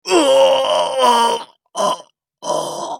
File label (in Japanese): 死亡; クラウドボネ